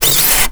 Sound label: box slide, styrofoam; physics; Portal 2